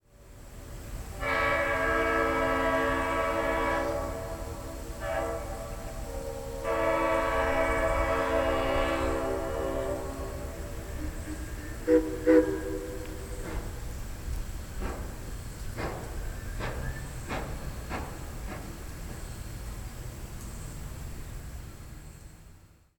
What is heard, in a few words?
Diesel Vs Steam